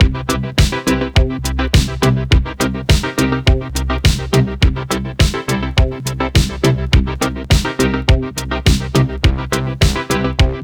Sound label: funky menu loop longer